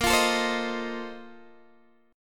A sharp Major 7th Suspended 2nd Suspended 4th A sharp Guitar Open G